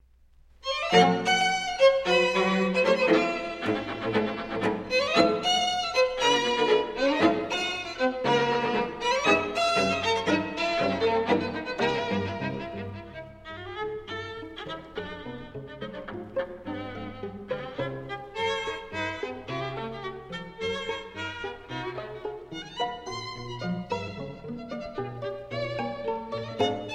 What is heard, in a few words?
String Quartet